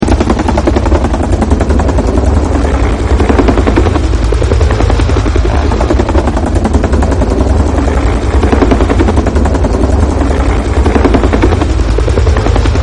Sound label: huey hovering